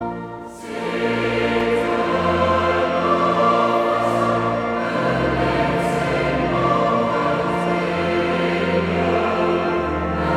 Koor gemengd; Vox Jubilans

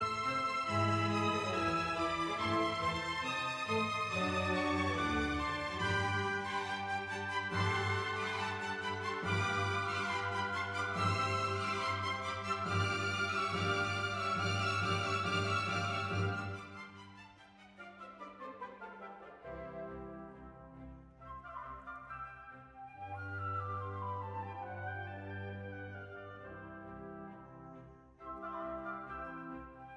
Pianoconcert Allegro; Mozart; No 21 K467

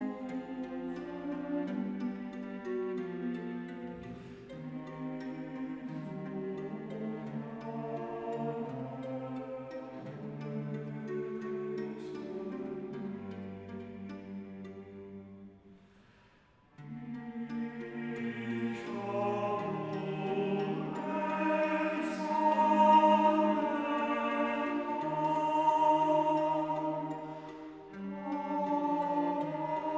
slot koor; Lucas Passion

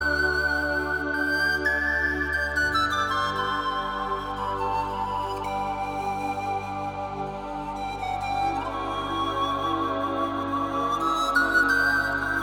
panfluit

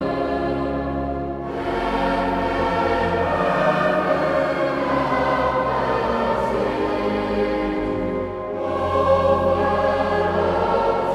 Koor gemengd

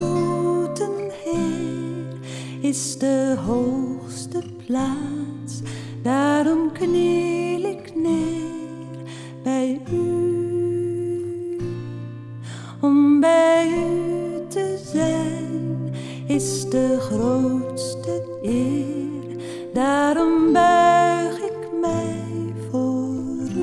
soliste

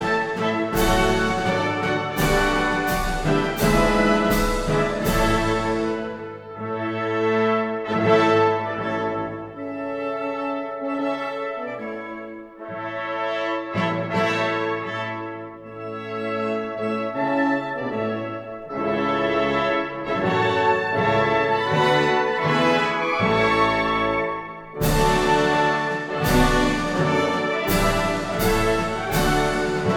Militaire Kapel

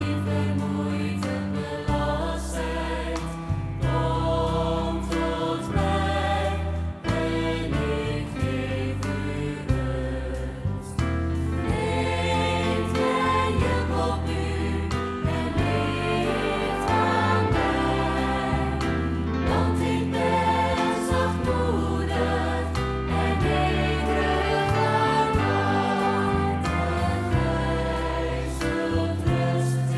Koor gemengd

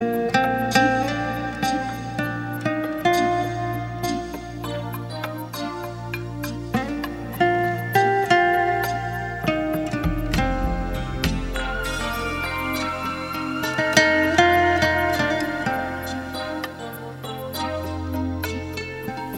instrumentaal

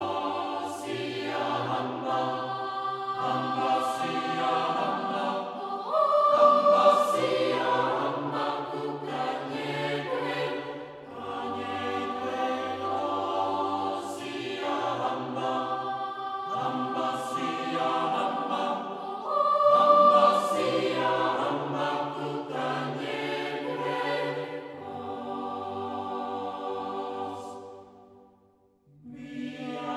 Koor jongens; Boni Pueri

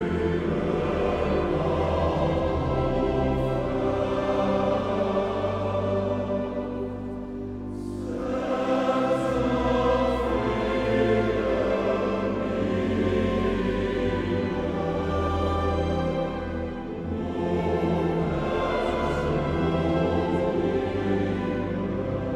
Koor gemengd

